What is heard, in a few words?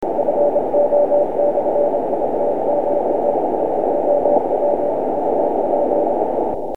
cw